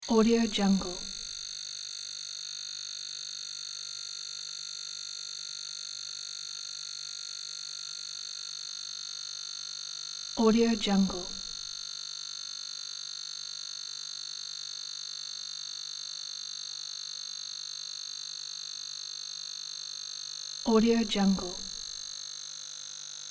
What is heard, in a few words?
parasitic oscillation